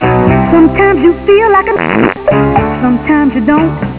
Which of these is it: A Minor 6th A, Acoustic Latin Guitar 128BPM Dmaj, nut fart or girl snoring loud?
nut fart